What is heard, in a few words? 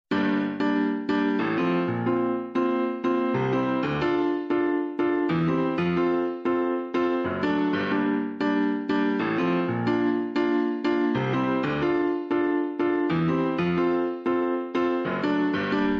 Piano Solo